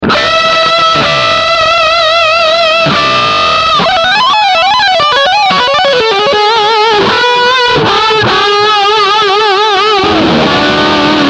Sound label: Peavey 5150 Lead channel